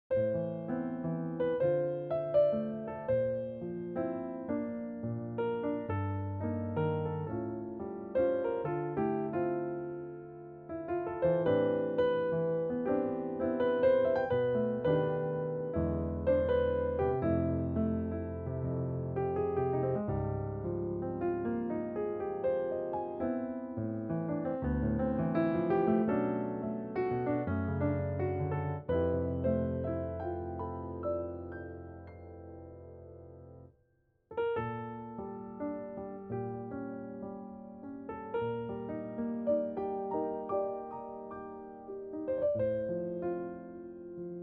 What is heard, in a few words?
solo piano; jazzconcert